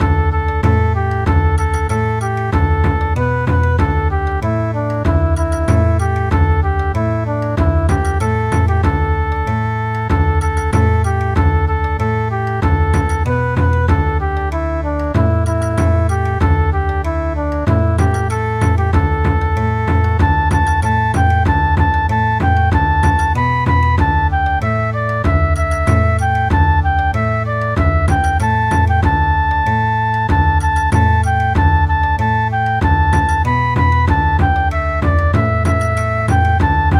Bon Odori

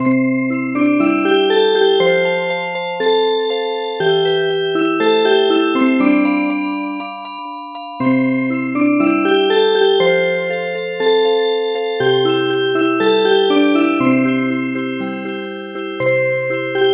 Music Box

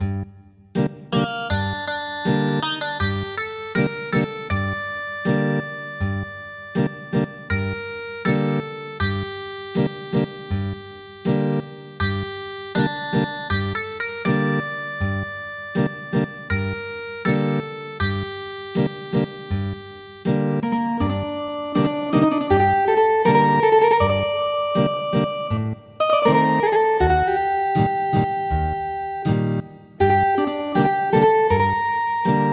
Ethnic Guitar; The Nanbu Cow Driving Song

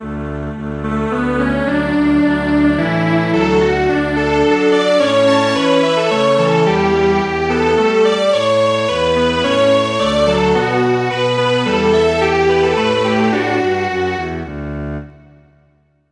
Strings Quartet

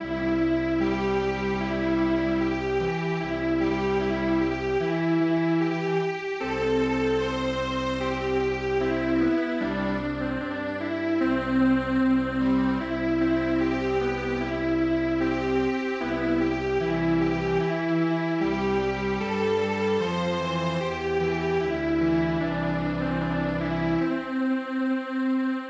Strings Quartet; Chosone Nore

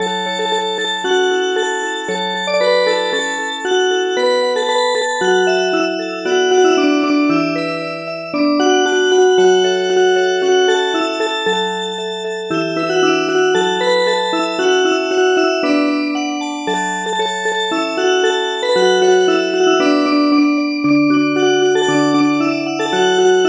Oroqen Folk Song Music Box

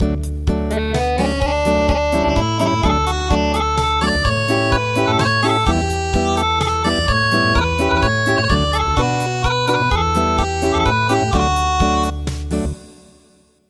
Acoustic

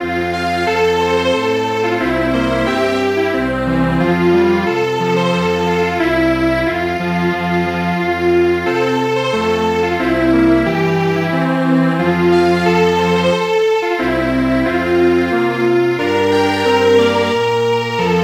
Strings Quartet; Hooray For You Who Turn Your Year

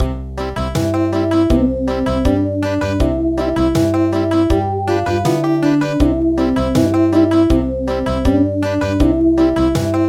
Country

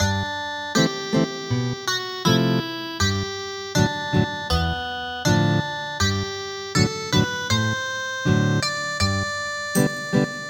Ethnic Guitar; Aegukga

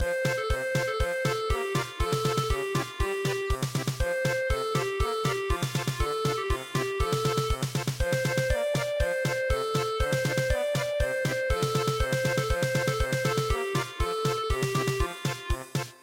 German Polka

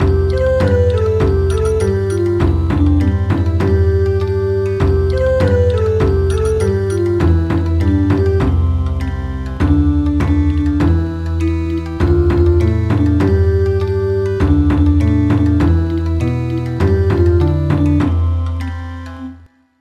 Bon Odori; Two Roosters

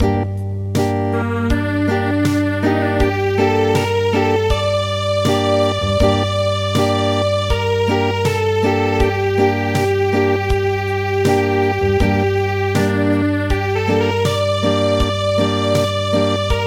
Acoustic, traditional; The Nanbu Cow Driving Song

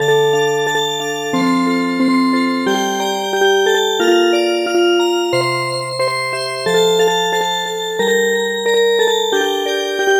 Music Box

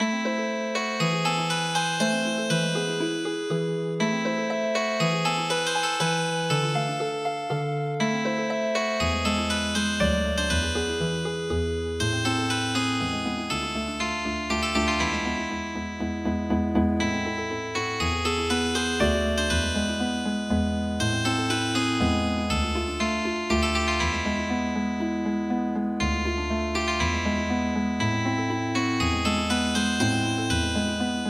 Healing Harp

